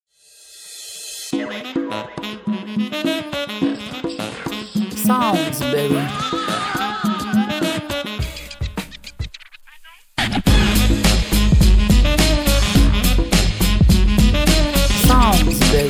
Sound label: Beat Bumper